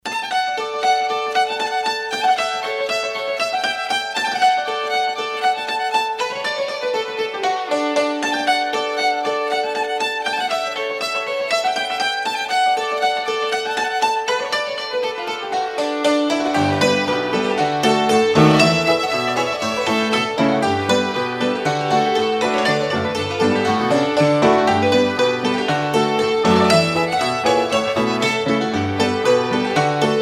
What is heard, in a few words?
Jaybird, Cherokee Shuffle